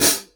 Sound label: hat